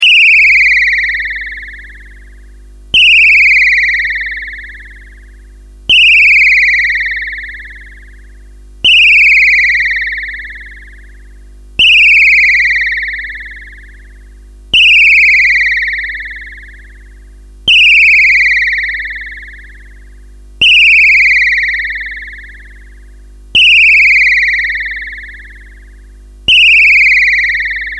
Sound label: birdsong